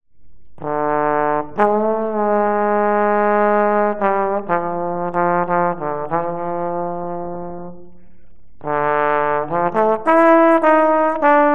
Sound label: trombone